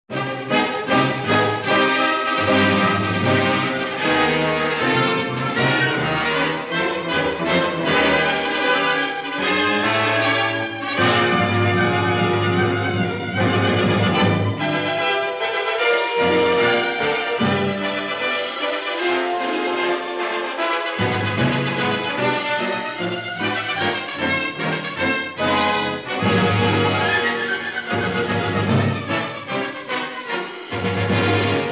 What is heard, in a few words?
Agitato in C Minor; Ren & Stimpy